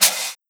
HiHat HalfOpen

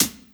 Hi Hat Close

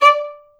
Violin Spiccato D; pitchglitch

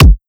Kick Boom

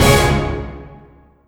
Orch Hit; pitchglitch

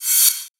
Cymbal Rev Short; Johnrayson; pitchglitch

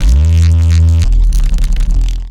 Sound FX Reese Acoustic

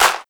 Clap Crispy Short

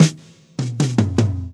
Tom Roll n Break; Drum Digger

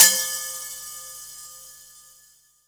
Bell Celtic Bell

